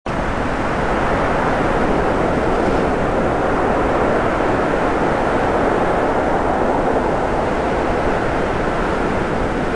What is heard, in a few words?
swamp wind